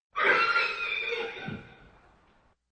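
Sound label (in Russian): ржание в деннике подальше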